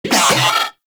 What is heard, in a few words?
Moa Vocal Pain; Warframe